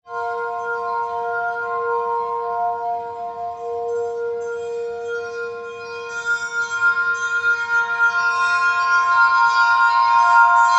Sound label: RING WET CHIME; eShop